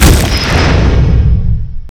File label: devour; doom bringer